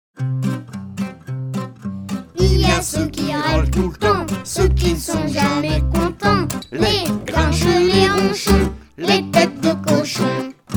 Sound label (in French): Plif plaf plouf La riviere Chanson Les grenouilles rieuses